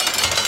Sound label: chain loop